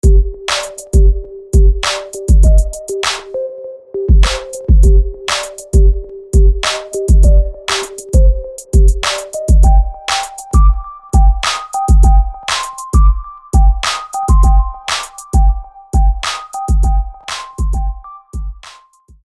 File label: Whistle; Jerk; SFB FX